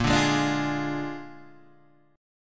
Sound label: A sharp 7th A sharp, Guitar, Open G